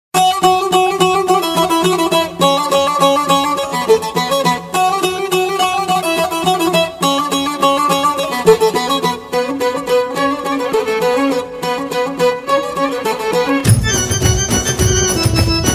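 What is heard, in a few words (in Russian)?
египетская музыка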